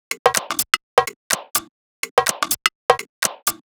Top C 125bpm, House Drumloops

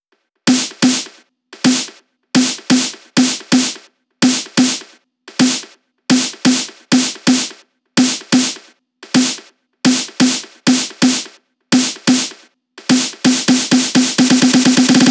Drum Loop 128BPM SN; Loop Elements; VEC5; Drumloops; Multi Loops

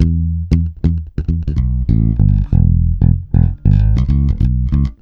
FUNKY WEST E, Bass, 96 BPM; Ultra Freakin' Bass; Sampleheads

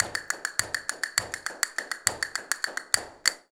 CASTANETS, Flamenco

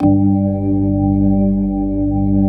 G1, Keyboards Old School; USB Soundscan vol.09; AKAI; BIG HAMM

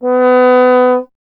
trombone; Miroslav Vitous; Solo Instruments VOL-1

